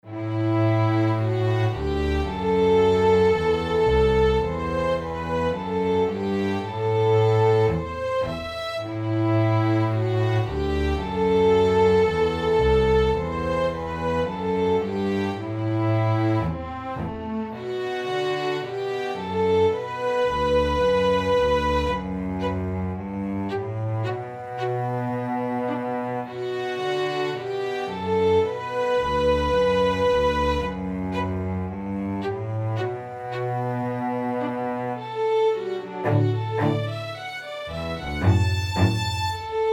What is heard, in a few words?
Luth violin and cello